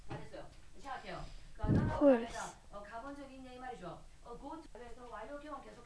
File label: horse